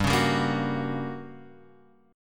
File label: G Minor Major 7th Flat 5th, G, down